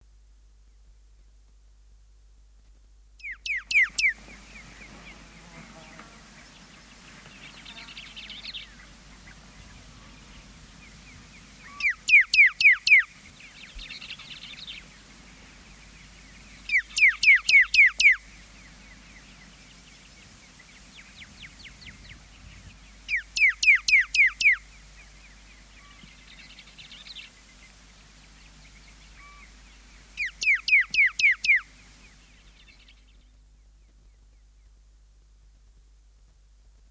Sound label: pyrrhuloxia